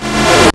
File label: Arcade Riffs